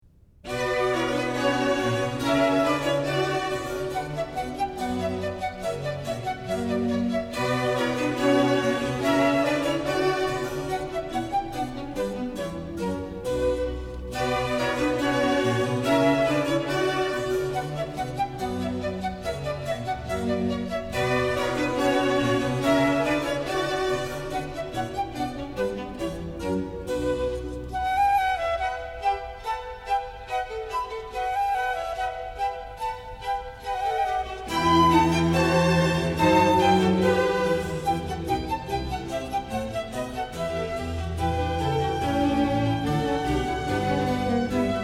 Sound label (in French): Suite Pour Flûte et Orchestre; Johann Sebastian Bach; Classique